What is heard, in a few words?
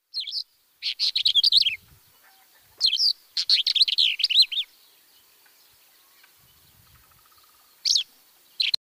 Pied wheatear; چكچک ابلق